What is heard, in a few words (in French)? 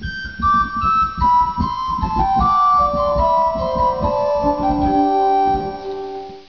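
Nasard, organ; Martin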